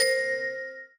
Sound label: ui card bounce